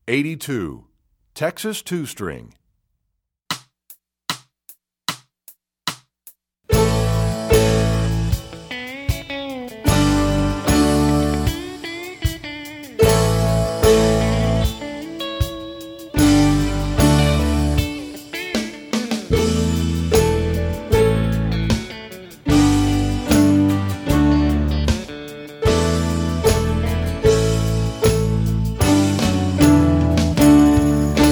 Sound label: Texas Two String, Strings; EE2000 Book1